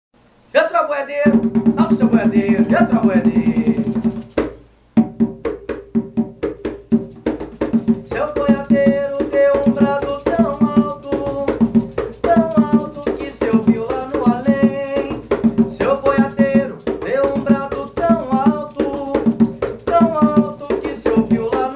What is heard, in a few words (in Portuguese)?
Boiadeiro Brado alto